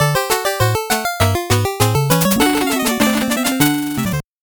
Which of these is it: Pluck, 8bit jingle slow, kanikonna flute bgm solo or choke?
8bit jingle slow